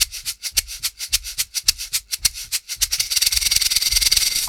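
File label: percussion, Roots Funk; USB Soundscan vol.41; AKAI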